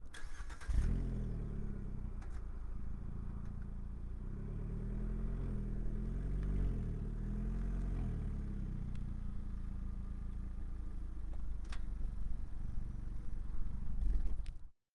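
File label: fiat 500 exterior start slow up short stop off; holophone; Sonniss GDC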